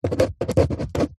office pen ball point write on paper on wood table; zapsplat